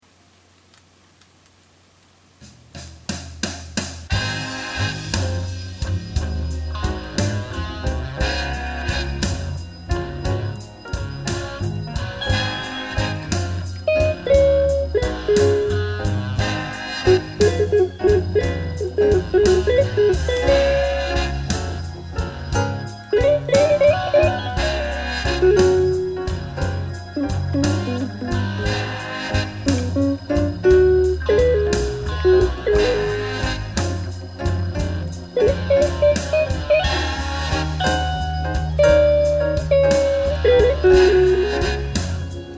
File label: Slow Blues Guitar